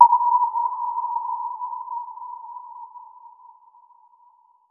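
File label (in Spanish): un clic digital muy limpio; discrete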